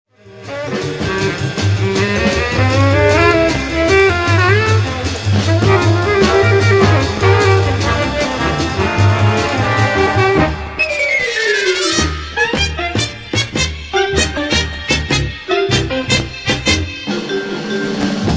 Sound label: Swing 288bpm